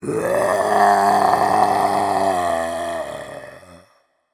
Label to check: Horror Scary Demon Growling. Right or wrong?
Right